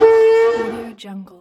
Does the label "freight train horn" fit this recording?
Yes